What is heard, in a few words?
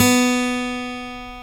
GTR 6 STR B4, Steel String; Roland LCDP02 Guitar and Bass